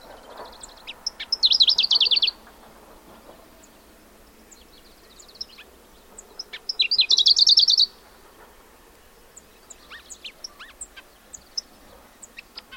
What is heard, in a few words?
Yellow streaked Warbler Phylloscopus armandii